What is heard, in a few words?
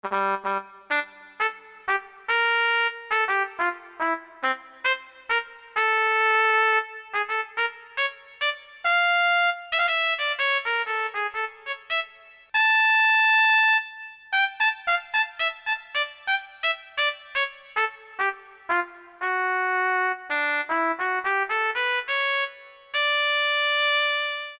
TRUMPET, Korg DSS-1